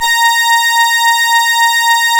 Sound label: ORGAN, A#; Big Fish Audio; Synclav FM